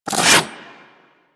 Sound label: Melee Whoosh; Enemies; Abilities; Warframe; Corpus; Arachnoid Hunger